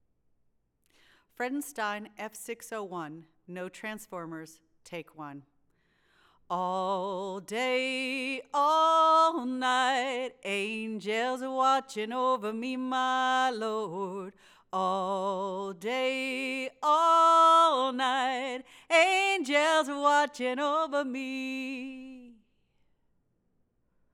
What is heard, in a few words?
Vox